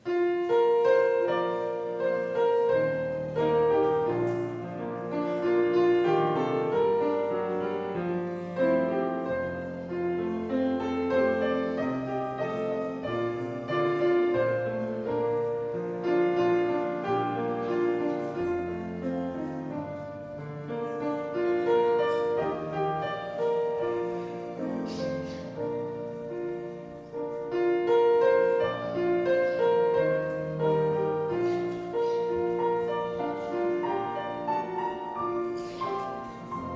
Vorspiel

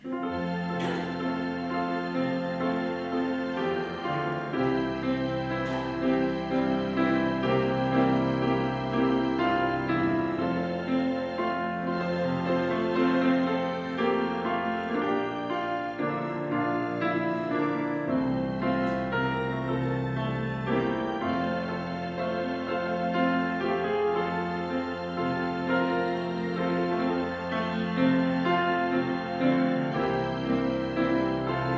Vorspiel

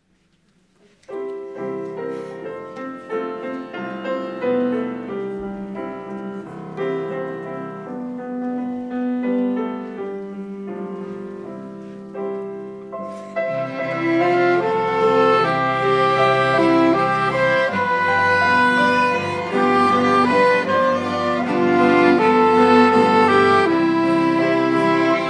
Orchester; Von meinem Heiland erzähl ich gern

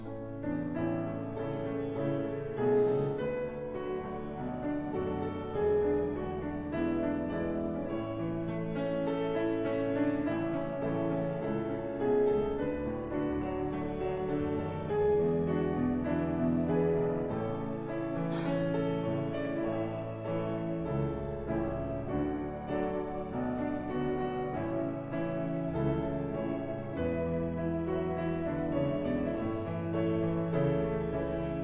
Vorspiel

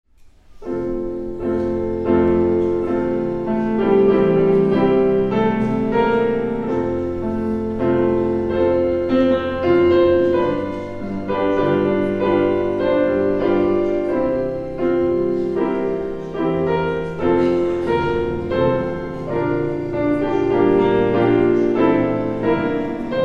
Vorspiel

